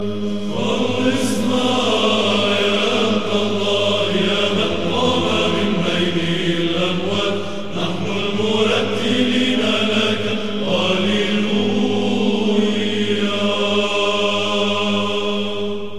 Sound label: arabic orthodox